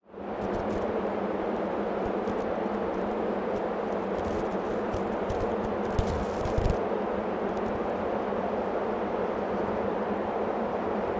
Earthsound

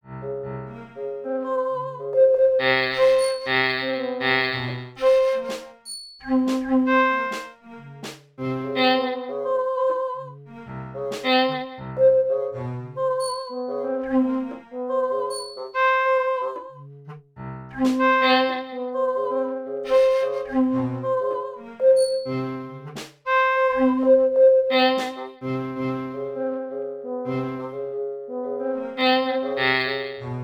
random timbre raw

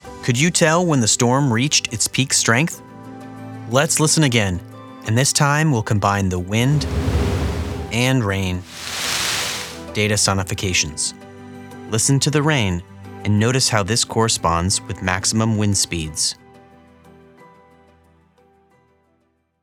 Hermine Update wind and rain intro

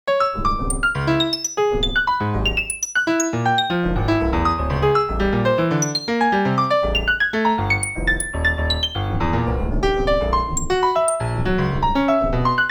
param pitch 0 127 normalized

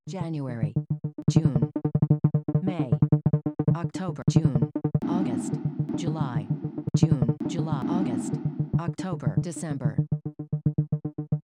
C02 Flux Sampler Example

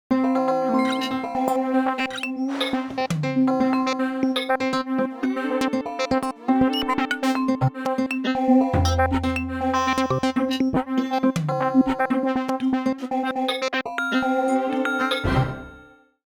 param timbre 0-127 normalized

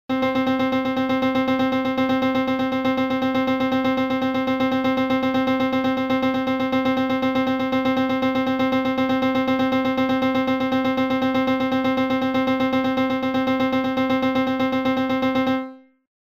param pan 0-127 normalized

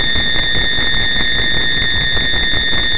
venice lagoon tides

